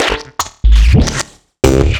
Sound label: Clickers, 120 BPM, Percussion; Sonic Foundry (Sony Creative Software); Underground UK House & Electro